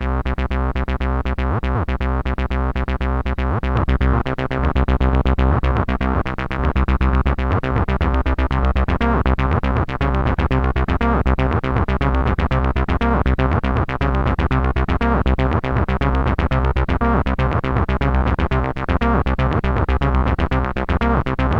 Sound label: bassline stereo; mbsid